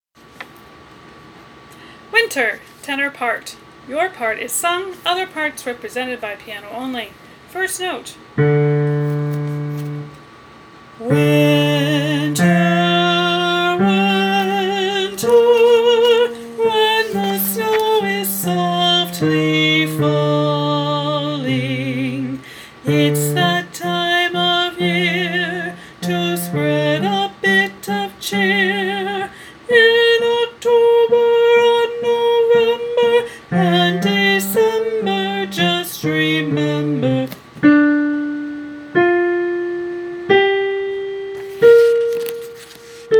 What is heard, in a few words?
Winter Tenor; Chorus Practice Recordings